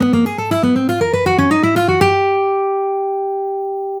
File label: Outside Lick